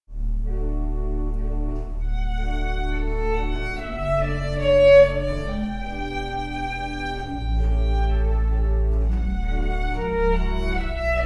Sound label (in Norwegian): fiolin